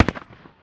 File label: admin gun dist; Original TK Sounds